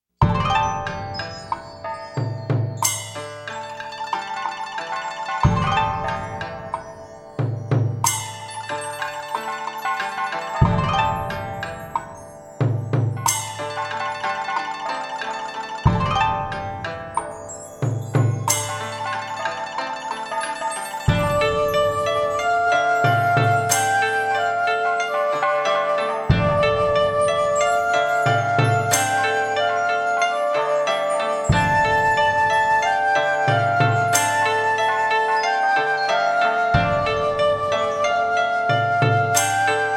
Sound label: Instrumental